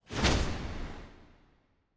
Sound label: ambience room shadow